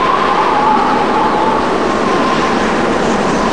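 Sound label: strong wind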